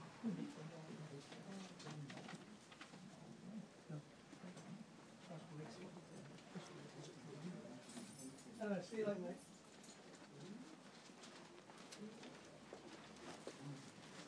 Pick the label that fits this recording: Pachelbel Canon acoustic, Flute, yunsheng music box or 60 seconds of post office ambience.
60 seconds of post office ambience